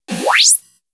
Channel On; The Look Back SFX

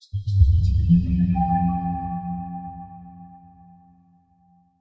Ethereal; Electa Quinney

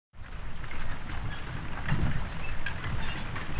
Horse Drawn Wagon; Electa Quinney

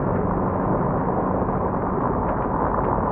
Car Road Noise; Poetry Project

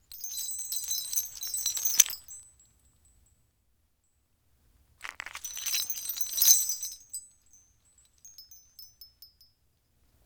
Bells, Tiny, Ceramic, Tingle, Foley; Universal SFX

